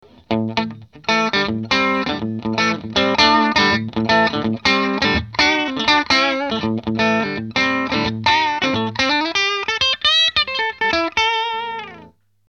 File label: Riff